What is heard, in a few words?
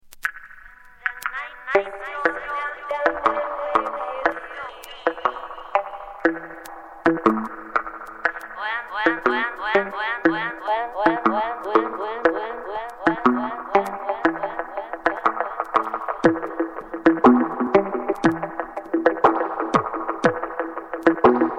Sweep Tone Dub